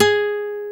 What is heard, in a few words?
GUI Note G4# Akus; Best Service Gigapack 2